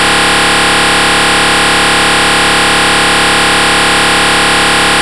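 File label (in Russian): звуки бип beep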